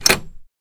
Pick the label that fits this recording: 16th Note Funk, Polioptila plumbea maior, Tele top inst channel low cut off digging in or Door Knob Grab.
Door Knob Grab